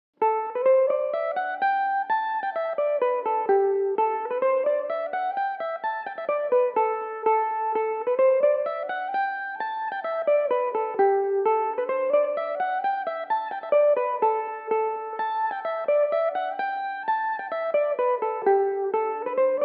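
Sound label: polka A dorian belmuse, irish mandolin; The Anascaul; Belmuse EM-190 electric mandolin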